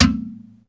MARIMBURA; drum

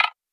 perc; Anthemic.Electro; drum hits

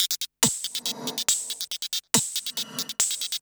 electro beats 140

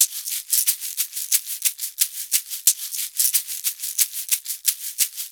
ETHNO PERCUSSION 90; ethno2

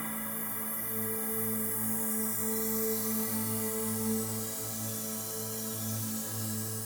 techno pad 140; Techno2; Keys

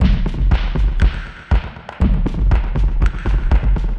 120 Beats, Drum Loops; Blissed Beats; Touch Loops; BlissedOutBeats

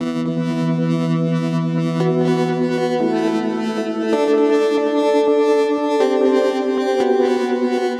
120 Cm old school synth; Loops; Touch Loops; BlissedOutBeats

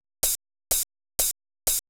HOUSE BEATS 125

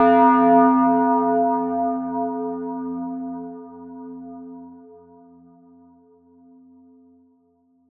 Atmos FX, Atmosphere & FX

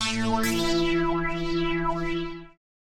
TEKNO 140, Techno; Techno2; Sphere

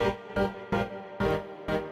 SYNTH INDST 125 A, Industrial, Keys

